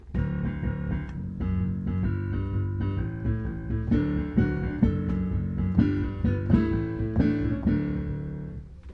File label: rocking octaves jig time